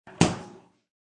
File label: Closing a refrigerator; Refrigerator Door Close; Kitchen; Household; SFXsource